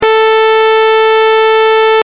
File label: sawtooth wave